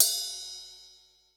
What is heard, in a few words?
RIDE, CYMBAL; drum